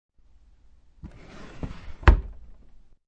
Drawer Shut, Dresser drawer being shut

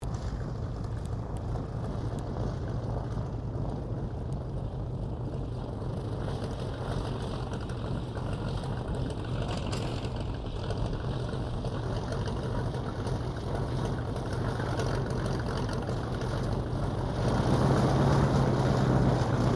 1916 Sopwith Pup replica taxi shutdown, airplane; Airplanes; Vehicles

